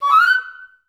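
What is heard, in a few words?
flute pickup F#5; Best Service; Peter Siedlaczek's Advanced Orchestra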